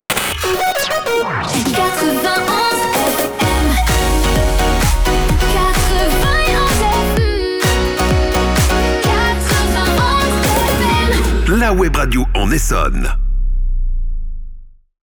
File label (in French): Chanté Theme1 F# 128 BPM Shotgun; Habillages